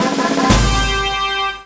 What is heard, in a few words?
Jingle Victory Fanfare; rayman 3 hoodlum havoc gc ps2 windows xbox 2003